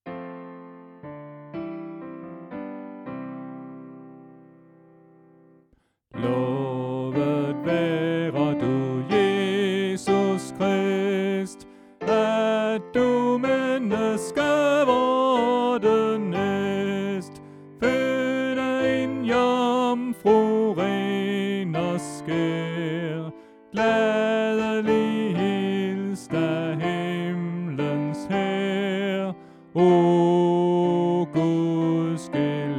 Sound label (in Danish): Sopran